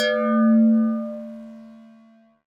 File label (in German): Gender Flach aufgehängt